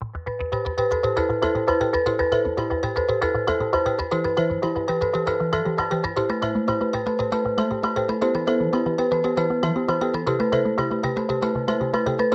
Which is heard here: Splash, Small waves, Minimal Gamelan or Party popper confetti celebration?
Minimal Gamelan